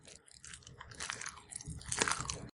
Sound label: chew meat